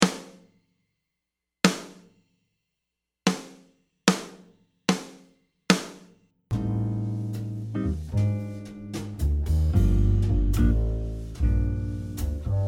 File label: blues f turnaround a11, guitare jazz